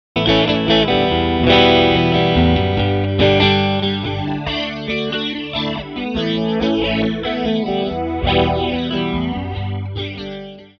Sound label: chorus